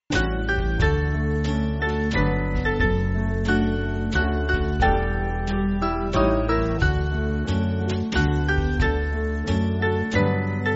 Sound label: Band; Freed From Self; Emmelar